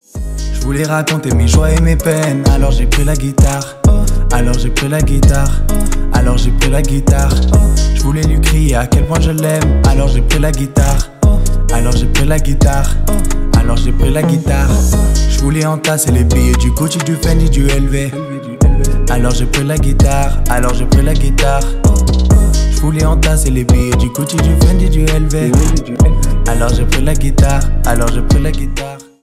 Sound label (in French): la guitare, guitar